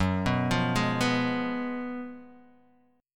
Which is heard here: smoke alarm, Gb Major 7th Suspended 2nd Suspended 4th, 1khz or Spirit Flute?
Gb Major 7th Suspended 2nd Suspended 4th